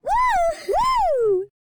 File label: Woman Woohoo; voice; Zomboid; Lifestyle